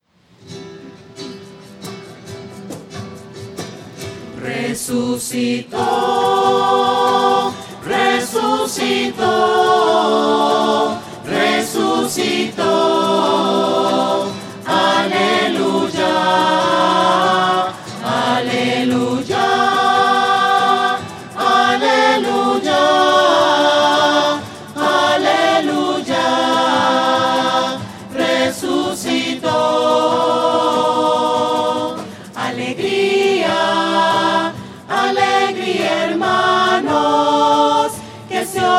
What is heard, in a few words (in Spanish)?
voces; resucito resucito; misa